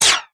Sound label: Space Gun; Sci Fi; 1001 Sound Effects